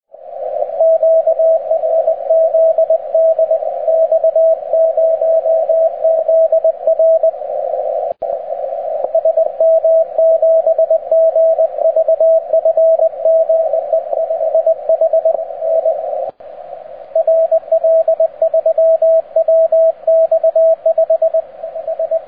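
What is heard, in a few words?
80m, vertical spitfire beverage; HAM-radio; 3 blocks: 1. vertical 2. spitfire 3. beverage 60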